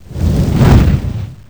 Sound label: Large Fireball